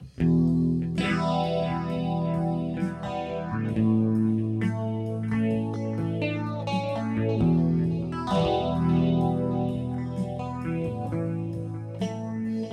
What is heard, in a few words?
breathe